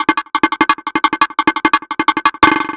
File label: Mixed Percussion, percussion loops; Drum n Bass; KJ Sawka; Drum n Bass & Beyond; Drum Tool Box